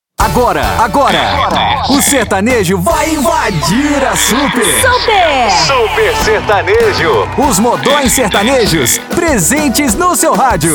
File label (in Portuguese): abertura super sertanejo